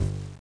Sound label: reload; Mutant Dungeon VR